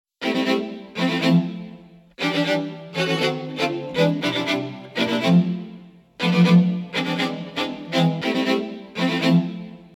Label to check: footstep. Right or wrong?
Wrong